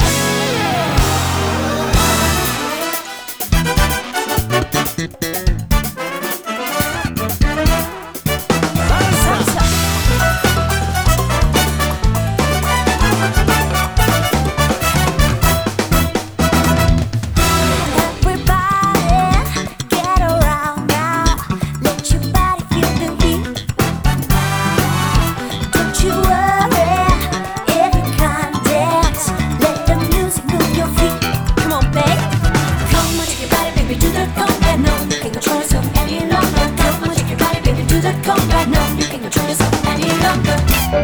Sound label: Conga